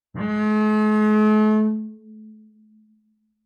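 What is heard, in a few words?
Bass arco fortissimo sul G A2, Double Bass; MIS Pitches - 2014; Strings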